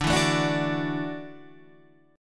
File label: E 7th Suspended 2nd Suspended 4th, D